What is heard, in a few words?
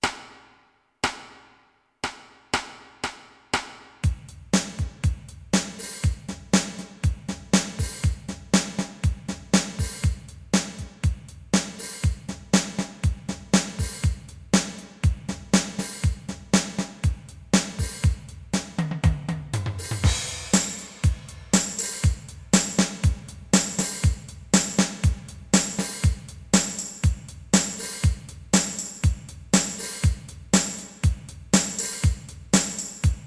SIMPLE COUNTRY 100 bpm, drum tracks